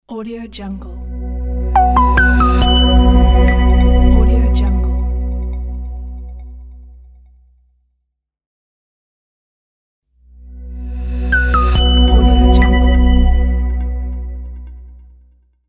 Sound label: quick logo